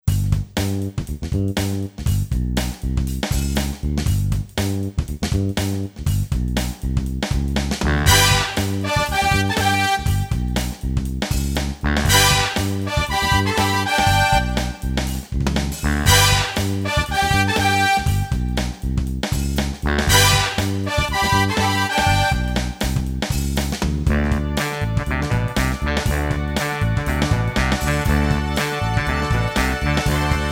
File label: bigband